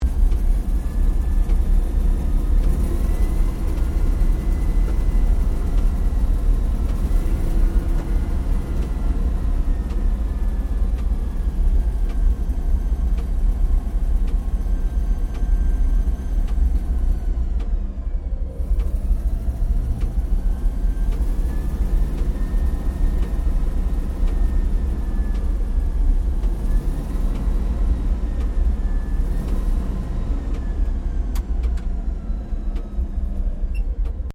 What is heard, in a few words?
APC FV603 Saracen Idle Interior, British Saracen Light armoured vehicle; Trucks; Vehicles; SoundEffects-Foundation1